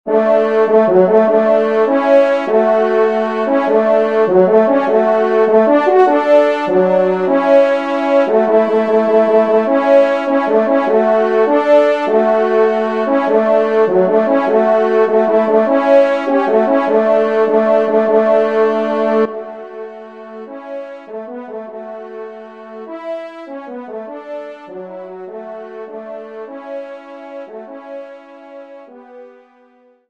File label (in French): Sortie, trompe; Devert; Sortie 1e Messe